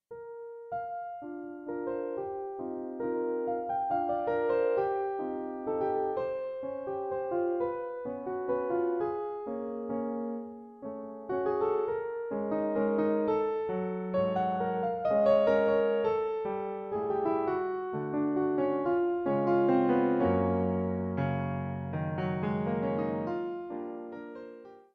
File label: summer morning for piano; from children album